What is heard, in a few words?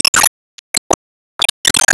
Enemy Rose Queen Active Fence Delete